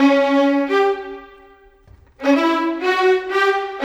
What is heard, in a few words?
Violins; Sonic Foundry (Sony Creative Software); Orchestral Series VOL-4; Rock and Pop; Rock-Pop 19